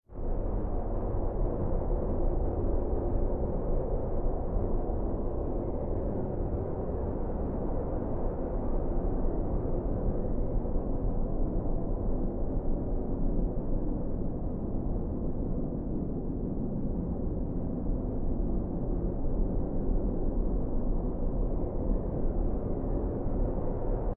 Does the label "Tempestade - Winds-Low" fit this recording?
Yes